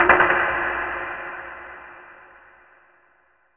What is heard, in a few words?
Impacts